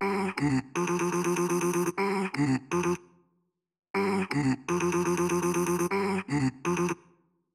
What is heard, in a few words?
Vocal Chops Dry F min 122bpm